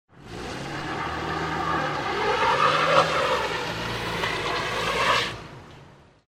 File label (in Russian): mashina ne mozhet vehat na raskatannyj sklon